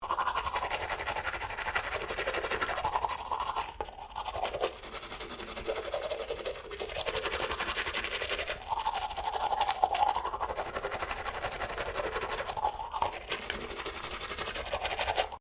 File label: MALE BRUSH TEETH; Male Vocal sounds; Brushing (Sound Effects:Human)